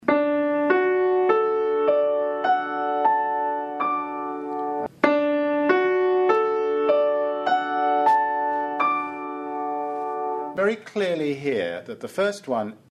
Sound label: steinway Legato Staccato